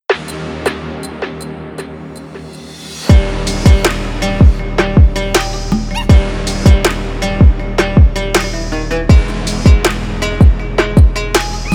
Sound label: arabic trap